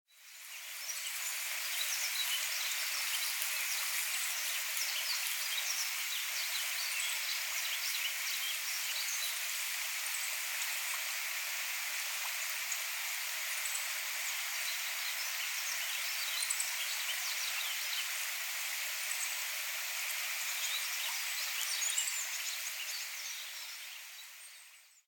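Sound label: Yellow-throated Bunting; miyamahoojiro; tottori; koe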